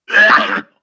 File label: flying bird bird spit